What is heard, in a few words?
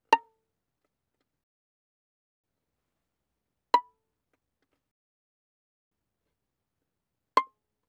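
Violin pizzicato fortissimo sul D Bb5 C6; Strings; violin2012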